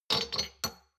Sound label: shot glasses pull out medium, gastronomie; berufe; soundpool